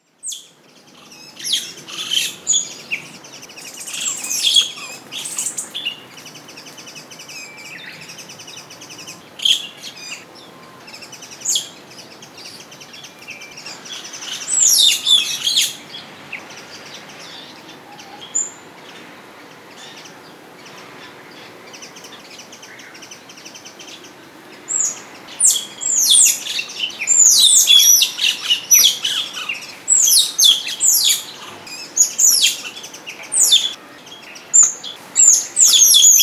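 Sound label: Pallas's Squirrel, kamakura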